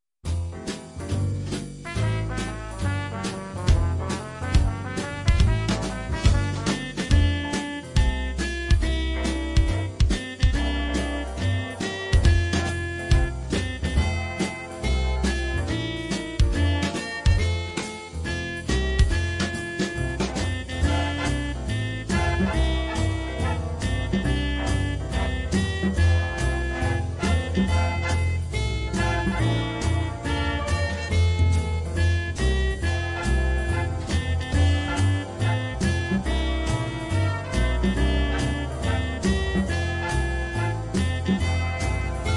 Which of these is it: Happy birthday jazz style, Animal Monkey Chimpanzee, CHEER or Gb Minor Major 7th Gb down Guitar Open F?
Happy birthday jazz style